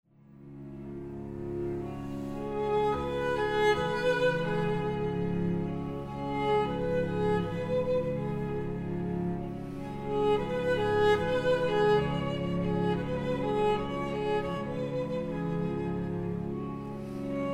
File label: Prelude